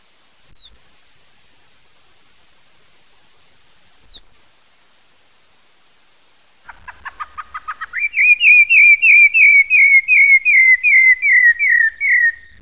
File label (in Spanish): zorzal; cs valleywarehouse